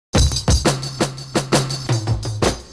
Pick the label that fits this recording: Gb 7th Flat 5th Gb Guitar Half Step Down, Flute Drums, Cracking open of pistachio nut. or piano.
Flute Drums